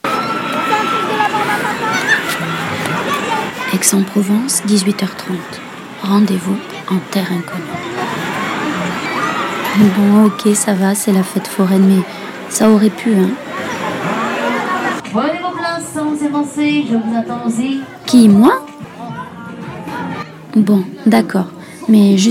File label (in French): carte postale sonore